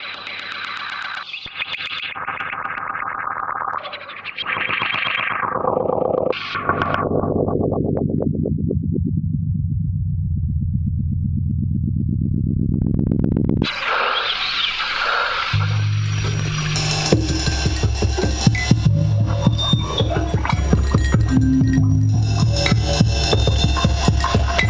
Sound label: Laughter